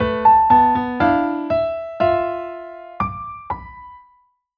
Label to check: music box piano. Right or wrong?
Right